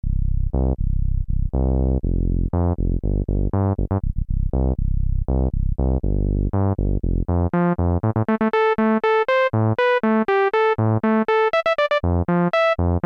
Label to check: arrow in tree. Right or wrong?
Wrong